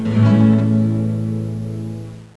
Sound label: NICE GUIT